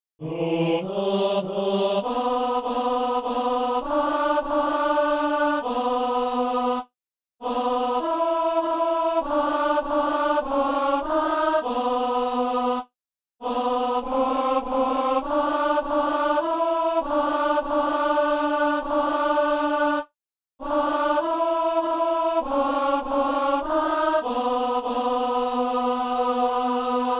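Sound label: tenor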